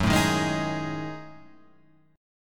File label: F sharp Minor 6th Add 9th, Guitar Open F; strummed; 6,4,3,3,6,3